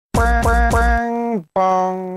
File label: Bing Bong; Facejacker